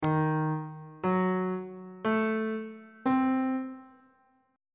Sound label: f flat harmonic minor 7th chord 7 is e flat diminished 7th on bass clef